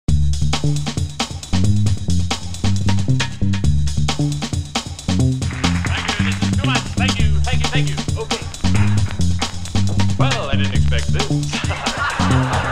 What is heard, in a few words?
The Jazz Bit